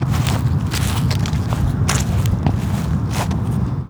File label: footsteps, percussion; HOUSTON; Moody Park